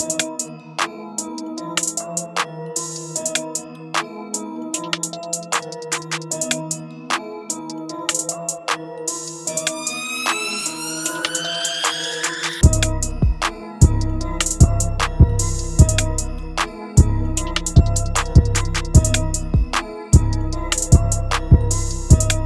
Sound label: trap; trap fx